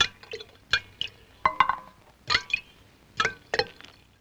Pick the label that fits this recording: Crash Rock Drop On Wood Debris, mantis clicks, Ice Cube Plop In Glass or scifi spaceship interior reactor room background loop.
Ice Cube Plop In Glass